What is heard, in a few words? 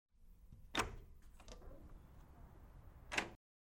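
wooden entrance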